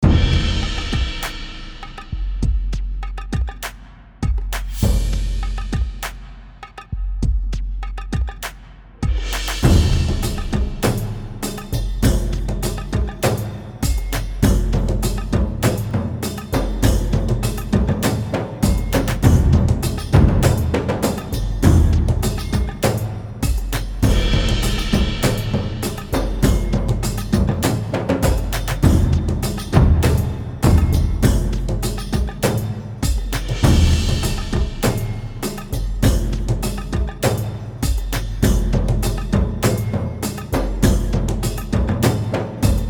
Percussion Only

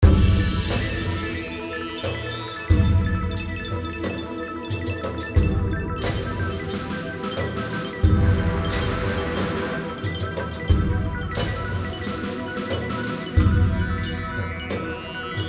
Survival Loop Remix

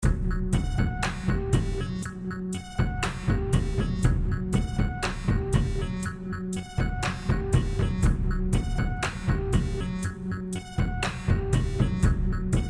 Evil Electronic

